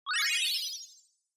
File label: Multi Accent Dry